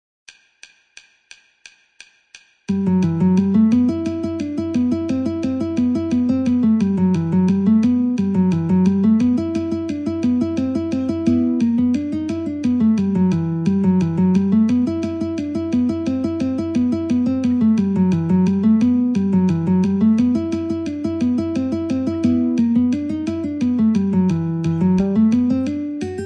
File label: Guitar metronome 88